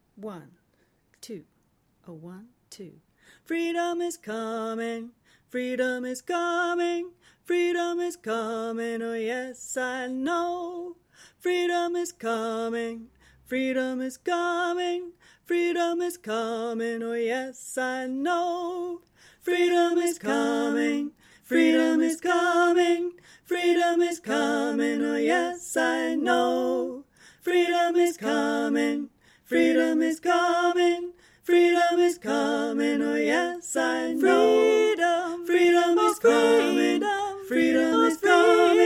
panned LR; Freedom is Coming

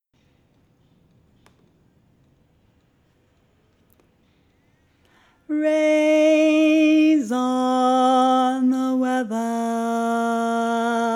bass

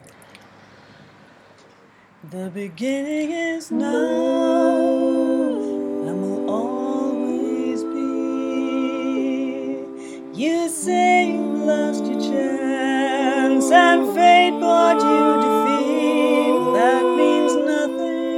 verse with ooh 4part, Folk Choir music

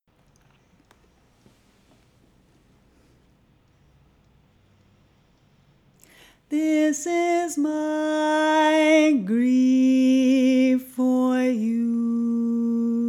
alto

